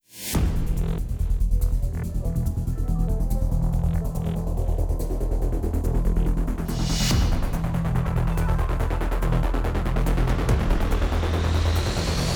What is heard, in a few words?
Tactical Action (Field); Xenoblade Chronicles 3